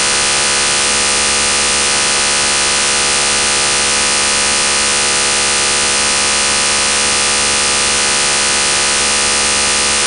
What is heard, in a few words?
TETRA sound